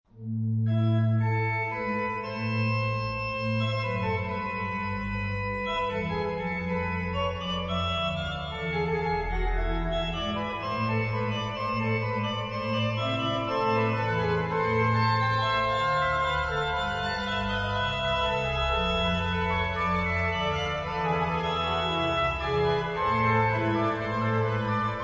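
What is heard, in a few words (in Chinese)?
鋼琴; HORTUS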